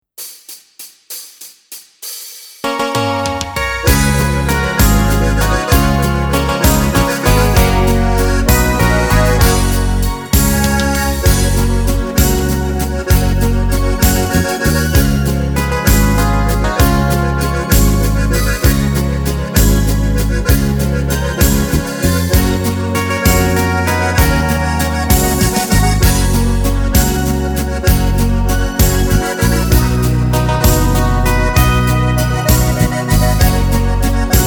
GIS CIS FIS ohne Harmonika mit Chor

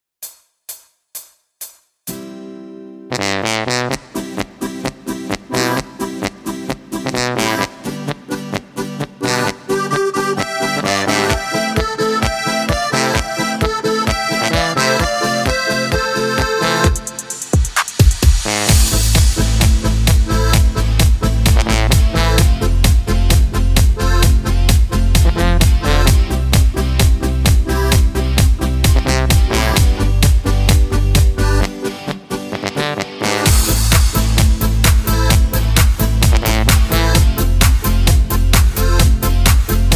C Dur Karaoke mit Chor

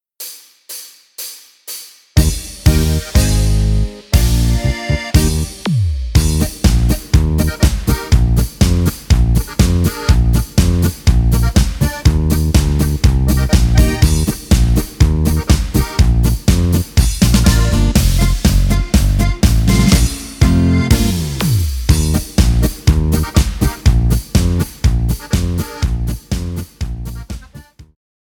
Regimentsmarsch; Deutschmeister

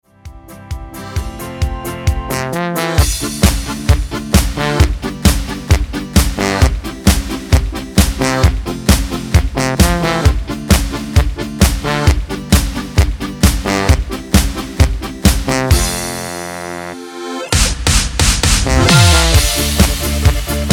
ohne Chor

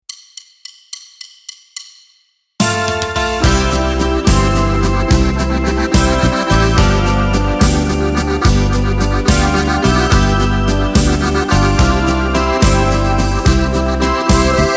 ohne Harmonika Lead ohne Chor

